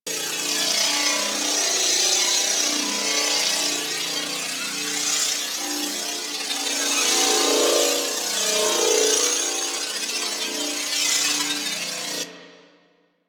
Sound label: Electrical Medium Loop; Props; Warframe; Ambience; Sentient Devourer